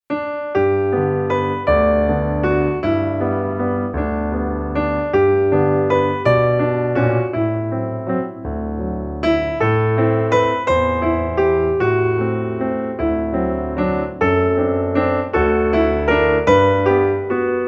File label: solo piano